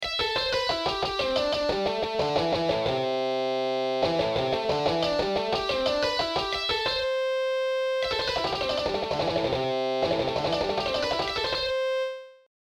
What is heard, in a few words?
Tapping Exercise on all strings; Tapping Licks